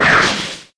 magic missile; wesnoth

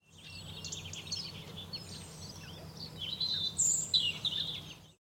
birds, ambient; wesnoth

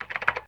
chat; wesnoth

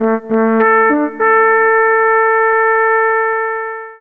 horn, horn signals; wesnoth

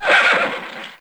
horse hit; wesnoth

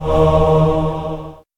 magic holy; wesnoth

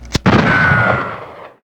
miss; wesnoth; dragonstick

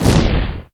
flame big miss; wesnoth

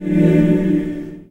magic holy miss; wesnoth

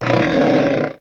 drake hit; wesnoth

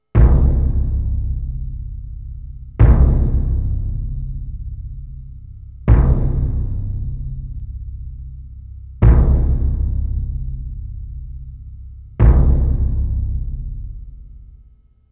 war drums, ambient; wesnoth

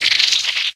naga die; wesnoth

